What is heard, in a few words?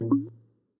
wine Bottle Pickup; Keepsake